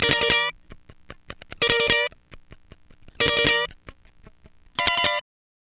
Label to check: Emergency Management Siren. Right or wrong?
Wrong